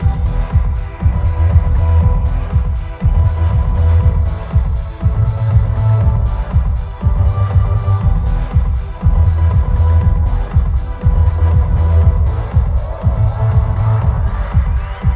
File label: Club Music Outside; Hatred